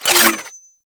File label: Hack Interaction Out; Parazon